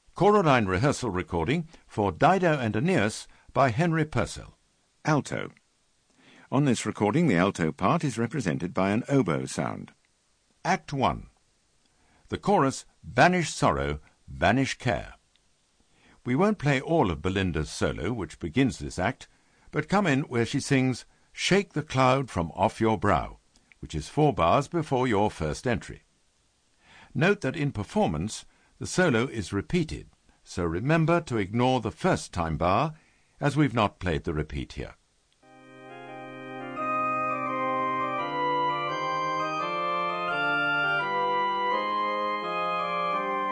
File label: Alto; Purcell D&A